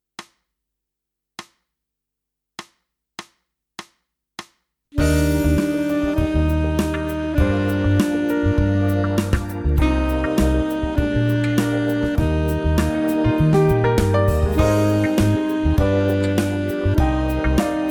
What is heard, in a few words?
Legato Alt; Saxophon; LEGATOÜBUNGEN; ineinander gebundenen LEGATOÜBUNGEN